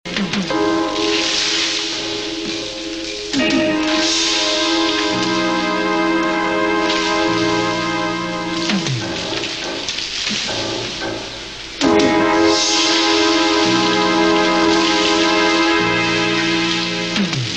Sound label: dramatic tunnel explosion; Shivers 1